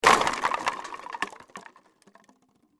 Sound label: Crash Drop Plastic Bottles Soda Pop Empty Fall Multiple; Crashes; Sound Effects:Objects; Blastwave